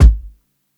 KICK LOUD, drums; BASEMENT DRUMS VOL 2

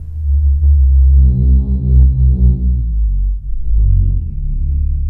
BASS L, Drum & Bass 175 BPM; Ueberschall; Drum & Bass Flash Trackz

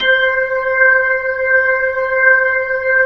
B-3, Jazz; Roland LCDP10 Keys of the 60s and 70s 2

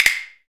Ban Gu, Roland BK-5 Percussion

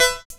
SYN SPACE, Dance Synth; Sound & Vision - Gigapack I CD 2 (Roland)

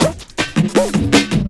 Drum & Bass, 160 BPM; Ueberschall; Drum & Bass Flash Trackz

